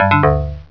syn Drum; Laser Lab

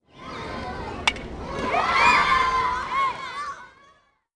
BALLPARK; buildings

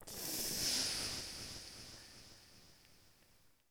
ethno 125; eJay

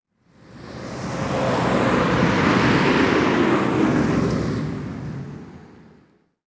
traffic; zones

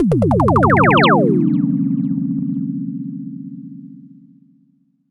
90 TR36 Outro Sound

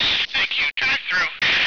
drive thru; Binder; Arkade

